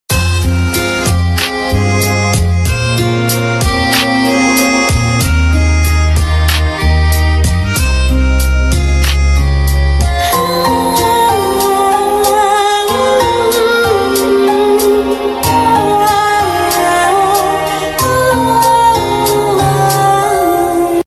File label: BG Tune; Kabir Udhita; TV Serial Tone; Channel V; Mastaangi